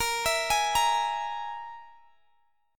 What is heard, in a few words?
E Major Flat 5th